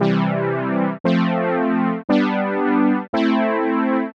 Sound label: 80s analog brass chords 115 bpm 2 bars